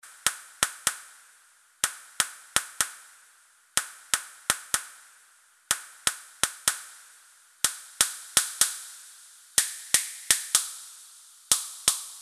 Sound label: MODOR DR2 MARCHING SNARE